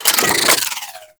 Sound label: Turret Throw Whoosh; Warframe; Corpus; Corpus Railjack; Crp Admiral Agent